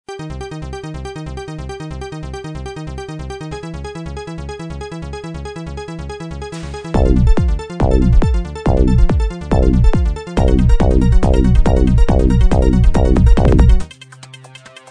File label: electro